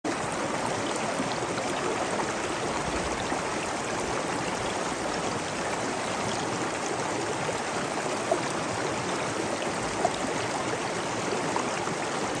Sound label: flowing water; Toms Swimming Pools